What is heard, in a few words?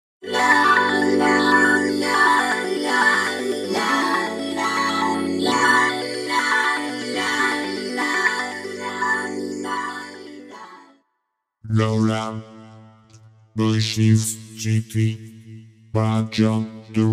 v-synth gt v2 vocoder vocal designer